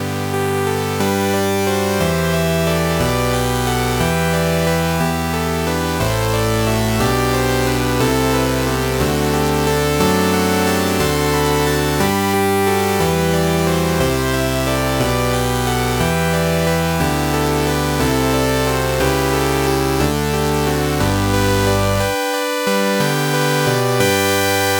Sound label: bass lead